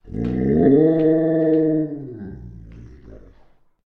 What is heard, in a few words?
Weird Dog Beast; SyrinScape; Library Depths